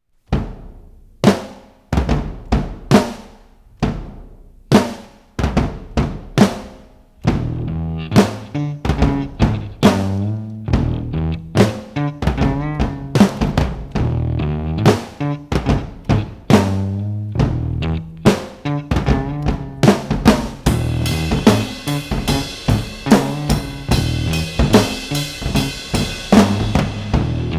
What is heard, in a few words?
Drums and Bass